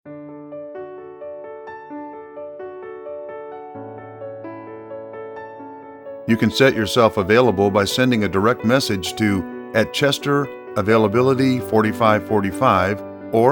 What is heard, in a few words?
dramatic